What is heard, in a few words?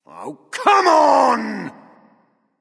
boohoo; Smite; baronsamedi